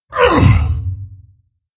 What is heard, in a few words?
Wound, Creature; Steelbreaker